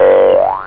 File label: Twang; 1 MEG ANIM